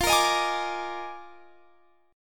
D sharp Major 7th Suspended 2nd Suspended 4th F, chord